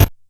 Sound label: Bent Kick